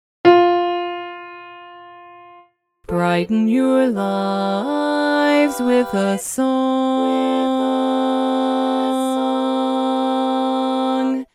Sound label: Bass Dominant